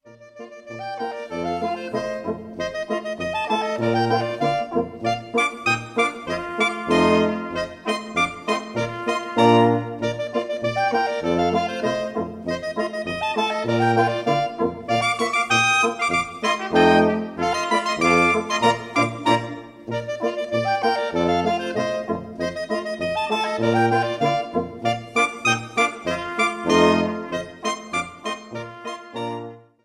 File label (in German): Kreuzpolka